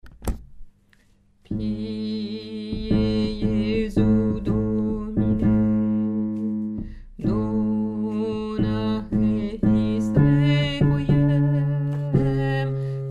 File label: basse